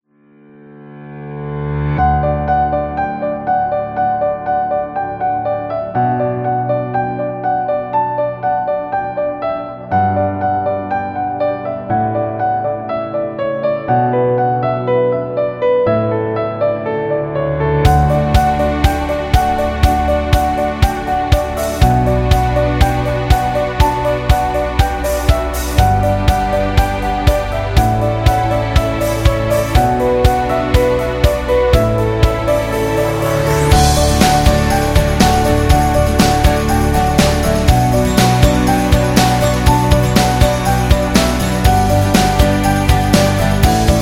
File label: Piano Corporate, corporate music; corporate music vol 6